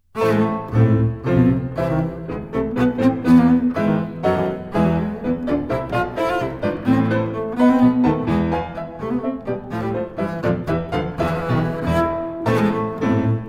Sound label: bass son